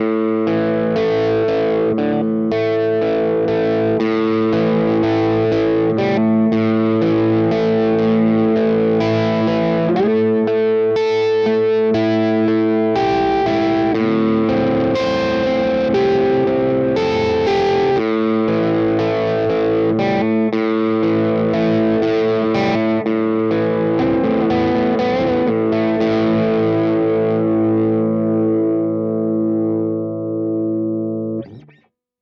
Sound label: Cort KX 500 Both Voice